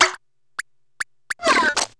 Ratchet Noise; PC; MARIOTEACHESTYPING2

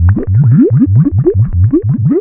underwater; N64; MARIOKART64

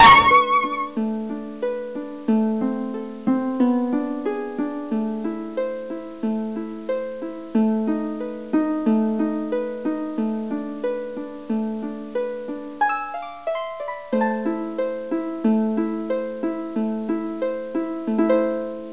Graveyard music; GAMECUBE; LUIGISMANSION

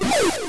defeat; GBA; MLSS

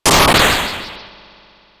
thundershock; SNES; mallow; SMRPG

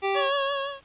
opening inventory; GAMECUBE; LUIGISMANSION

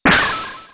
star gun finish; geno; SNES; SMRPG

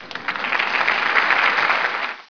Crowd Clapping; PC; MARIOSFUNDAMENTALS

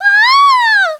ahhhhhh; DS; ML BOWSERSINSIDE; Peach

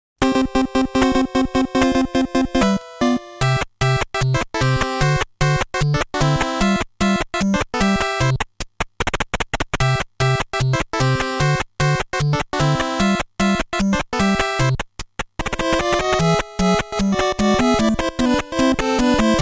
character select; NES; SMB2

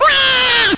baby cry; baby luigi; DS; MARIO LUIGI PARTNERS IN TIME

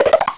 Cartoon Sound; PC; MARIOSFUNDAMENTALS

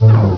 Deep Woop; PC; MARIOSFUNDAMENTALS

